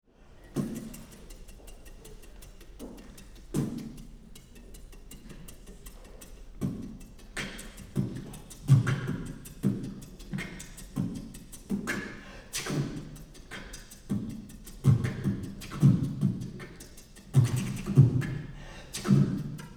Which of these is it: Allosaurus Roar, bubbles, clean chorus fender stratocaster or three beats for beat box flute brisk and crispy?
three beats for beat box flute brisk and crispy